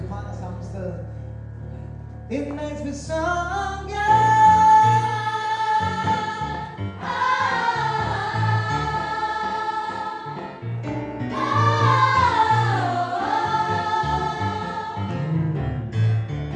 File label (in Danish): sopran, kor